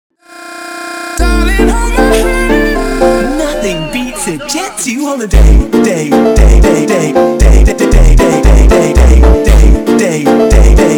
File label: Funk slowed